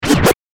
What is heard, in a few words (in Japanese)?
スクラッチ